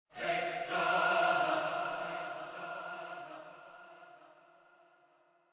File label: MUSIC